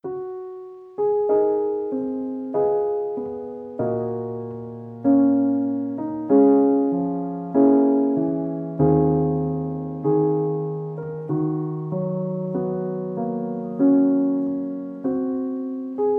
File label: prelude in Db